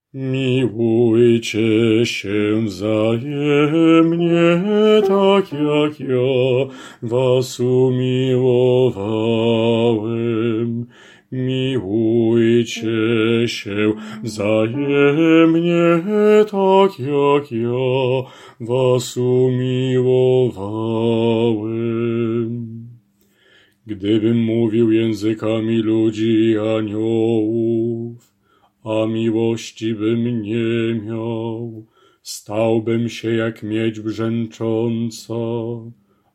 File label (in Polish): Bas; Milujcie sie wzajemnie